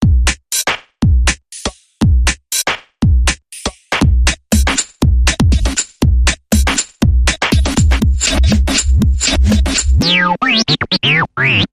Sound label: Bot Electronic Bumper Reversed